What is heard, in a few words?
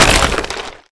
DMG STONE